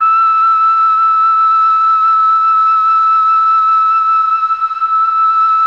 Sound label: MELLOTROF; Masterbits - Vintage Voltage; MELLOTRON FL